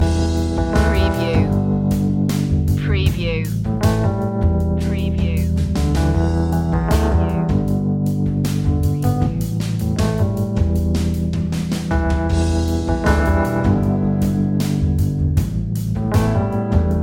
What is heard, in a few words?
central groove loop